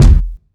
kick drum one shot E key, loudest frequency: 233Hz; kick drum samples